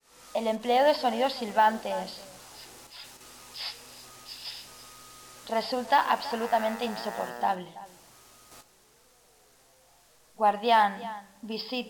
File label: Noise Festival; 1st and Last Noise Festival Of Menorca